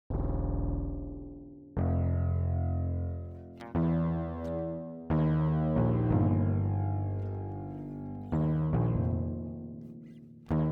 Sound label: band practice